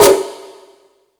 Percussion FX, E-MU Pro-Cussion

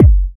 BD, Dance Mega Drums; Best Service

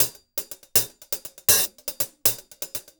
080, Top Ends, Beats; Zero-G; Monster Beats

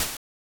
Hi-Hat; Sonic 3; M8; Genesis

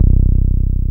BAS TB303 C2

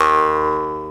Jaw Harp Oo; Planet Earth; Digital Sound Factory Planet Earth

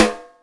Snare Les, Yamaha DTXpress Acoustic Snare

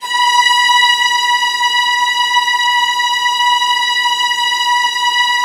VIOLINS C# R, Synclavier Strings; ILIO

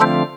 ORGAN CHORD; Techno Trance; E-MU Formula 4000 Series Vol. 2; Dance Hits

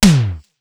Simmons SDS2000; SDS2000 Factory4

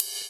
Ride; Equipped Music; Loungin House

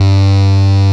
G1, SYNTHESIZER ANA LEAD; AKAI S6000 CD-ROM - Volume 1

